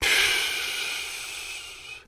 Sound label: trader sigh; 7D2D; rekt